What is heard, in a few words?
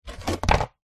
sport skateboard set down on deck; zapsplat